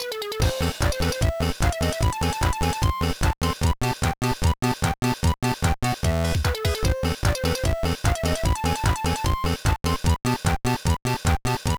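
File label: background music; No No No Puzzle Chailien; GBA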